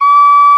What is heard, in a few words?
WHISTLE, Ireland; E-MU Producer Series Vol. 7 – Old World Instruments